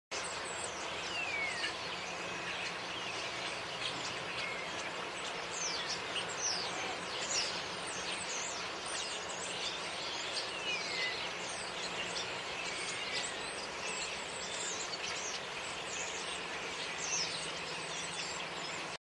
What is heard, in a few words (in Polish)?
szpaki; zakole wawerskie